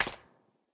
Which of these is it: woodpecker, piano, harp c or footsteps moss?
footsteps moss